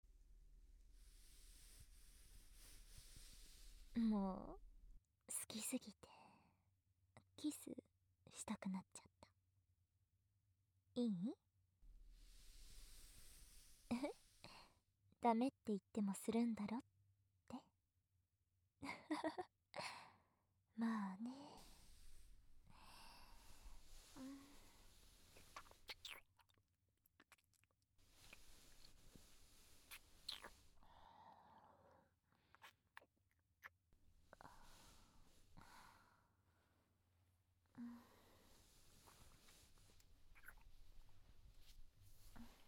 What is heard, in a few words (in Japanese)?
キスと耳舐めお姉ちゃん